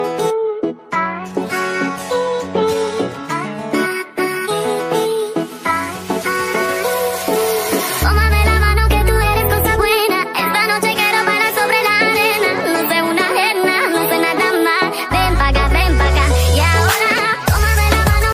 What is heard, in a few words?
english ringtone